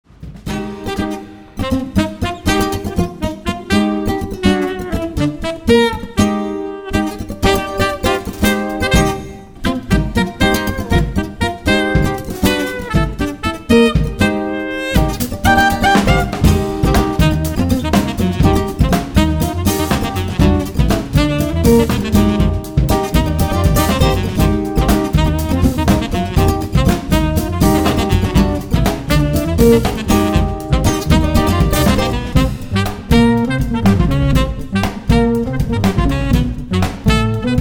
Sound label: samba